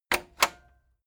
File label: cassette eject click slow heavy